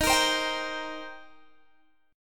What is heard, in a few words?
Eb 6th Eb down Guitar Open G